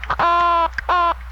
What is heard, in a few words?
Opsanus beta; ReassignFFT